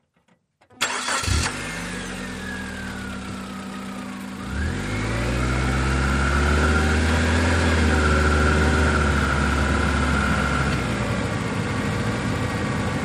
Sm Car Eng Accele, compact

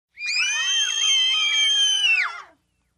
5 Kids Scream Short

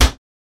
Cabinet Door Slam Di

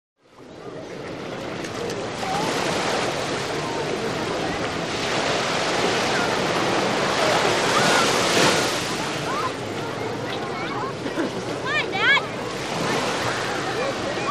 Beach Atmosphere Wal, kids play

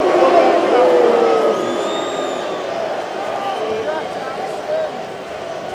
Crowd Boos Dies Down

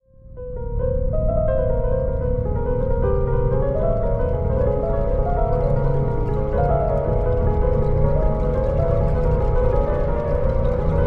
Music Bed Dis Horror, piano

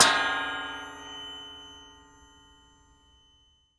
Metal Plate Hit Susp

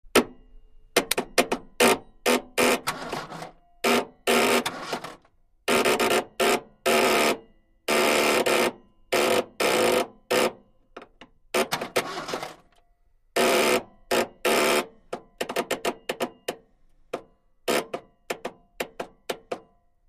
Car Solenoid Zaps; Short And Long

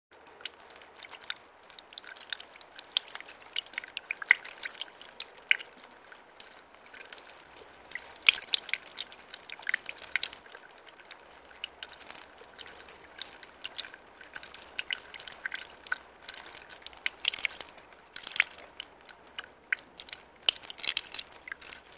Water Trickle 2; Light Water Splash And Movement.

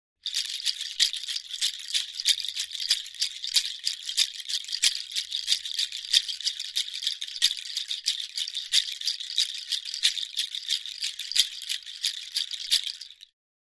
Shaker Bracelet Natu, percussion shaker

